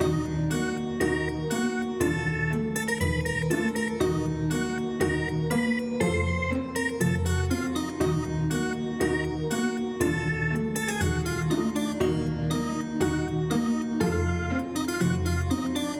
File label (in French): fin niveau gagnant-perdant (loop); wicked witches